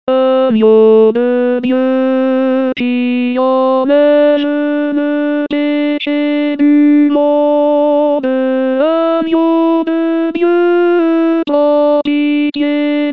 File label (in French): Agneau De Dieu Alto, Chorale